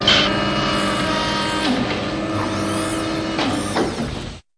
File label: actuator hydraulic lifter; Sound FX Real Life